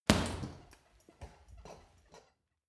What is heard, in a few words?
boxing punching bag hit hard wiggle; sport-freizeit; boxen